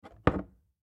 Aftershave Grab Cupboard, Household Aftershave Grab Bathroom Cupboard; Primping; Blastwave